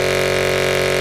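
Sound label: engine exhale, advanced engine sounds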